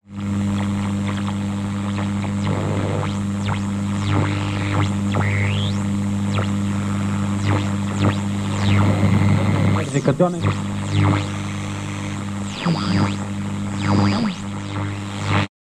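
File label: Radio Tuner AM Long Tuning, static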